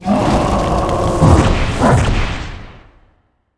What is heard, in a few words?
minotaurus lord attack, monster